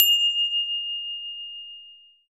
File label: percussion bells